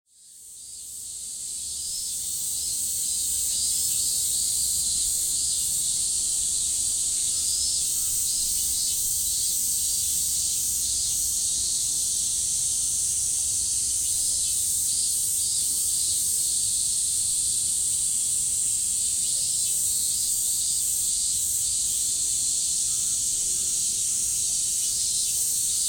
cicada

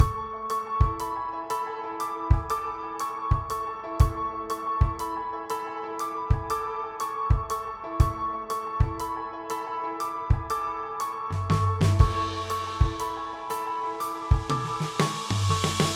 BGM Pop; DIGLOOPS

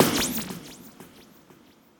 Gun

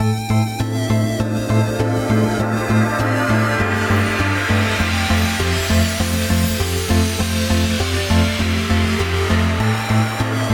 BGM EDM; DIGLOOPS

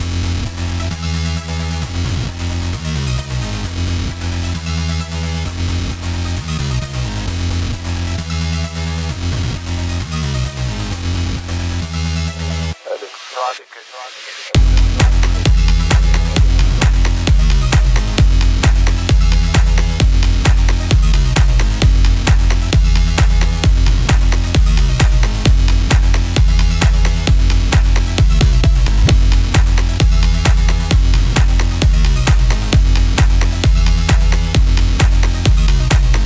BGM ELECTRO

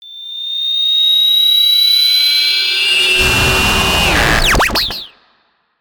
Appearance

